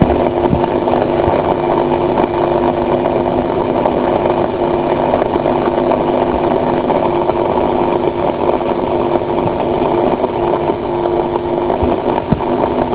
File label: noise